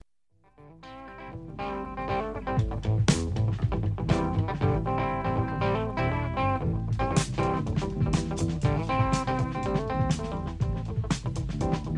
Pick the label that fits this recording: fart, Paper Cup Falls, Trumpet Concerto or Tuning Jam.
Tuning Jam